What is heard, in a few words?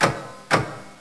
clock tick